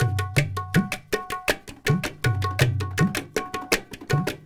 INDIA L, Heart of Asia, IND ENS

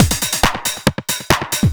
percussion loops, 138, Techno

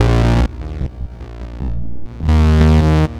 Bass, Futurist Drum & Bass

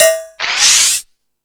Multi FX, Drum Machines, E-MU Pro-Cussion

